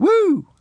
"woo"; drew carey

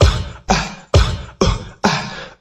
uh ah uh uh ah, Beatbox; Drum loops

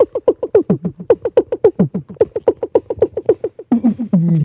ugh ugh ooh, Beatbox, Drum loops

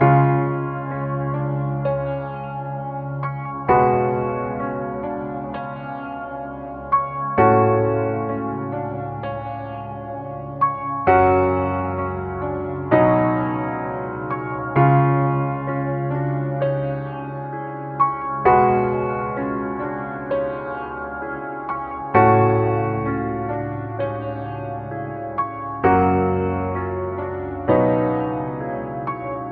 piano, Sad

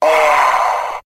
ah; ooh ahh

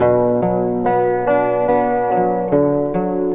guitar, Sad

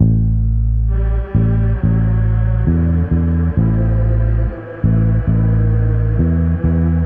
bass, Loops, Sad

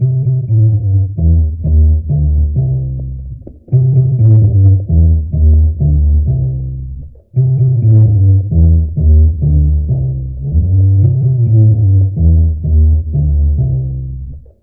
bass sudden rolling, Loops Phonky

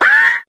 "ah", Voice

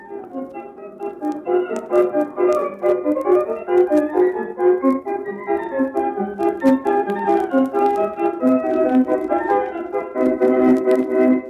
circus music

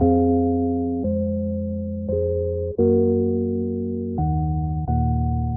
church organ

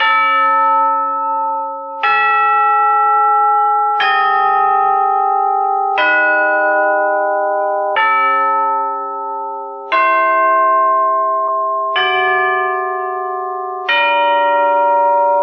keys bell tower, sad loops

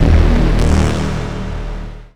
electro belch, Synths; Notes

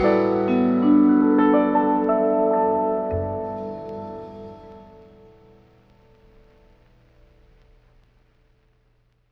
unfolding vibes

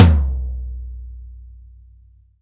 Toms, Real

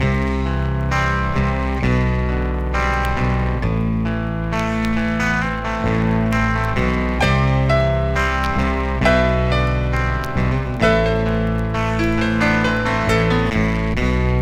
guitar dark, Sad; Loops

